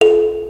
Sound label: Model Build